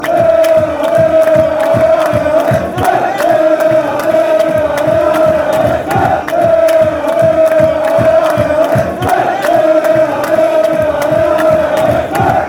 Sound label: allez, fan chants